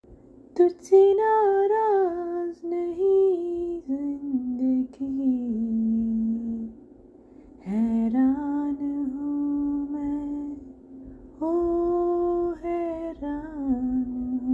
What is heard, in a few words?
Vocals